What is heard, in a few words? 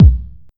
wet kick drum single hit b key